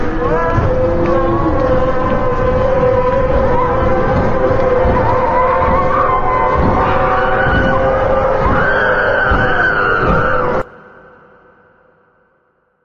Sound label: enraged zombies; mike koenig